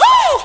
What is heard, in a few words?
wuuuh; Urban Shakedown